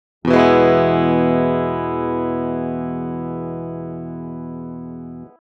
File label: SYNTHETIC E major Pickup Middle, electric guitar; electric guitar synthesis